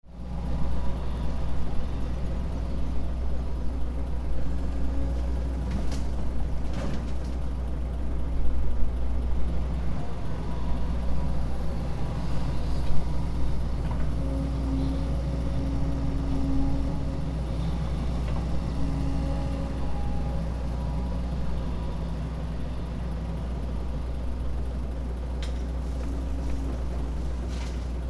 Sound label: decontamination